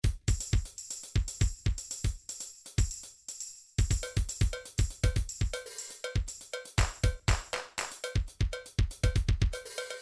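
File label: electronic funk; sonar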